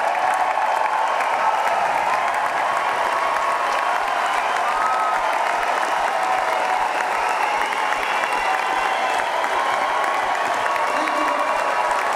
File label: Crowd; Bluegrass; ls10